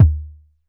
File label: ACCUSTIC DRUM; Music Store Sound Service; Einstein